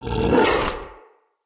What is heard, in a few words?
Battle Dog Attack Bite; Animals; Vocals; Snc Battle Dog